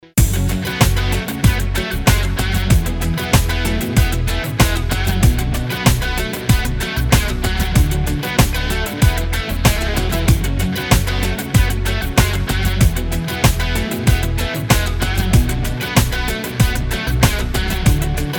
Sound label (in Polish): instrumental